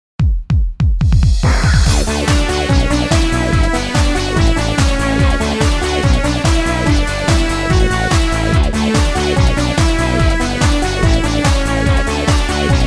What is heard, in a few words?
DANCE